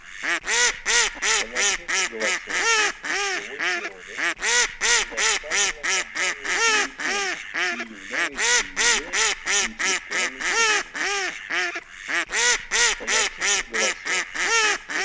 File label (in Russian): Кряква Germano; plurifon